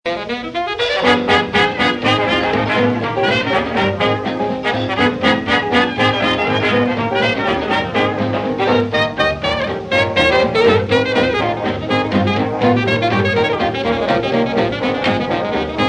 Maple Leaf Rag, big band 20s

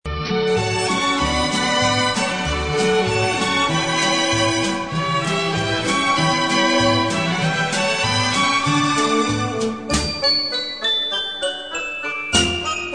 instrumental; Rudolph Classic Special; xmas classics

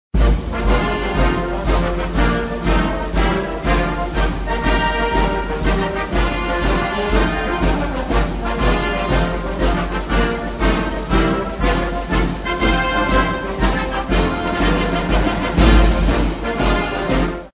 us airforce song

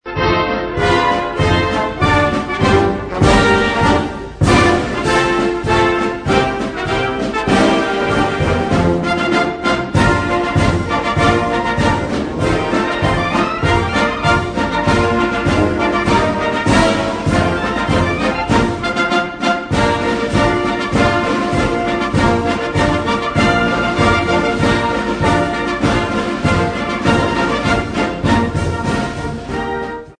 french foreign legion march; us marines

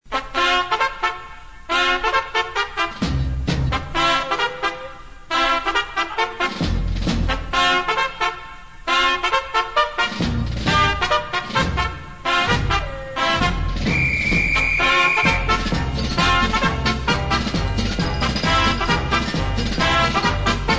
Magic Trumpet

